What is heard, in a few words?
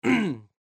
Clear Throat